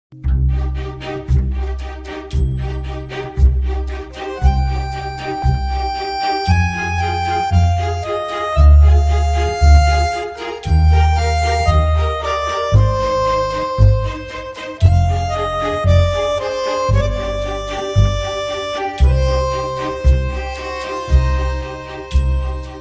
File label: movie bgm ringtone; yennai arinthal